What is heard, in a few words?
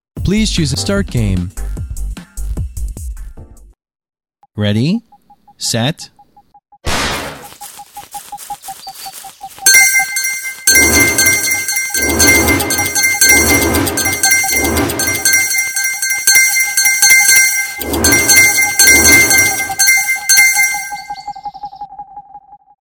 overload; Old Super Egg Hunt; Liam Erven